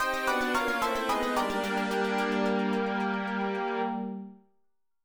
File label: game over bad chest